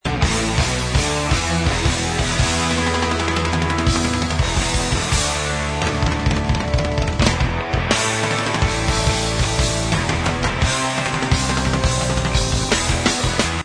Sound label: Fills; Drum